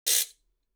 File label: ratchet; パーカッション; サンプリング素材